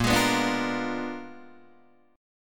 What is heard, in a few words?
Bb 7th Flat 9th Bb down Guitar Open D